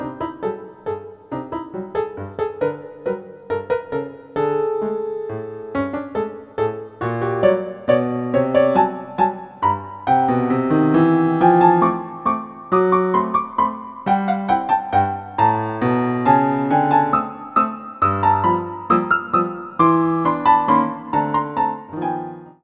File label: Piano and all